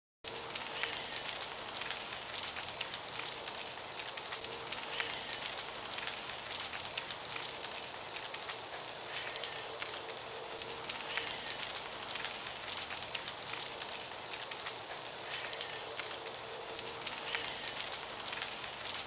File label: oak leaves